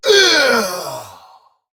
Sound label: Death; Warframe; Dax Ashigaru; Battle Barks